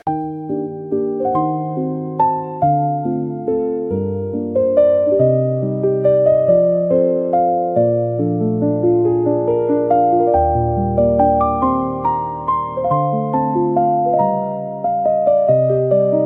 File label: calm piano